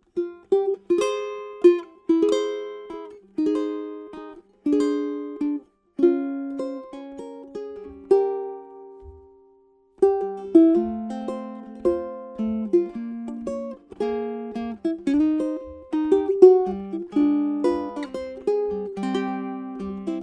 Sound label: uke